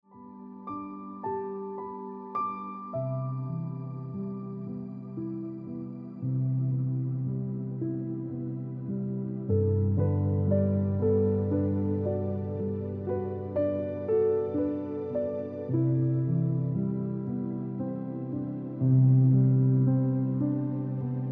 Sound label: Ringtone